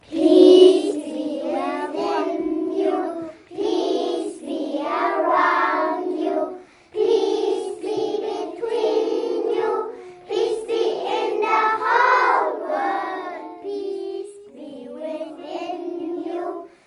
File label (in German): Kindergarten Lied Unisono; Weltfriedenswoche